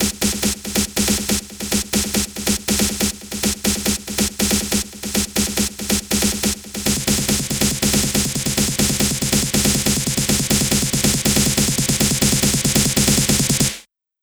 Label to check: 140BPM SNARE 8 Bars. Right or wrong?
Right